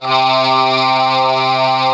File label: Vowel Ah; Mo' Phatt; Digital Sound Factory Mo' Phatt